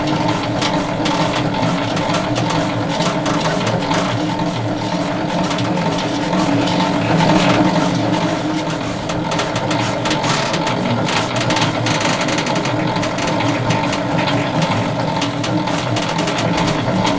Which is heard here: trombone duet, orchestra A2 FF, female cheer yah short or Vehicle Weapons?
Vehicle Weapons